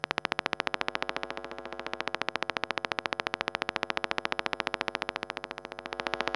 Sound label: Electromagnetic Fields